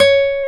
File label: guitar classical guitar, nylon string; Roland LCDP02 Guitar and Bass